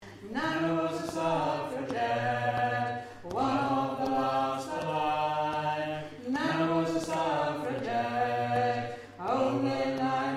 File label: Chorus Bass